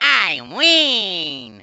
happy; tf; waluigi

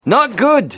chase; shrek

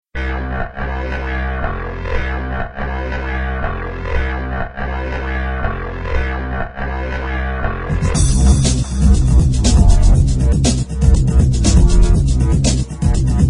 game startup, stinger

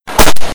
fireworks pang; tf

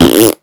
poop fart

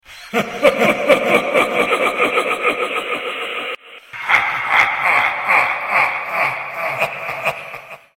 haha

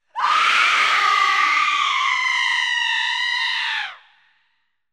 witch, zombie; dod

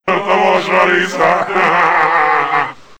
alert; bioclone

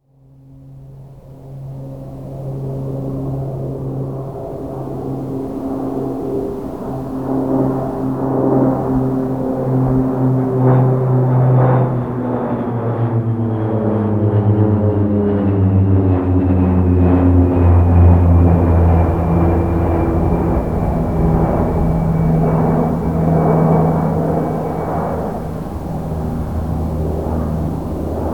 flyover; dod

